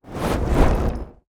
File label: Swipes SFX Flags; Electa Quinney